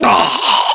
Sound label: death, voiceovers; english